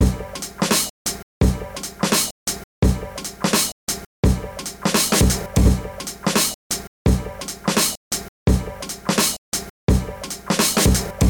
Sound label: Breaks 170bpm; Kizes Cut-up Breaks